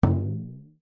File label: cartoon impact drum ascending; zapsplat